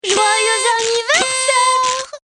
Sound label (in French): anniversaire; MOBICLIC 65